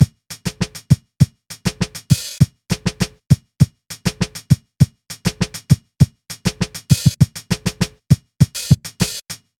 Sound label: Bpm 100 Soldier Drums